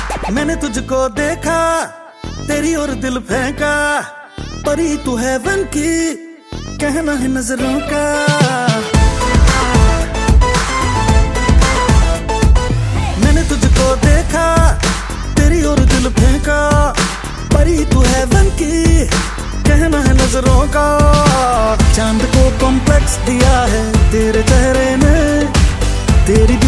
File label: Hindi Song